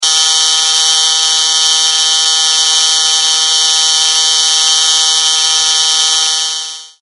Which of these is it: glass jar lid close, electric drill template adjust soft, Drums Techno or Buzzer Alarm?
Buzzer Alarm